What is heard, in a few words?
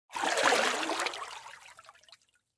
Fish Splash Water